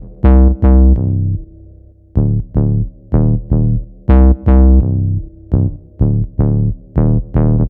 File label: Ana Bass; Бас сэмпл (Минимал-Электро); 125 BPM; Electro; Minimal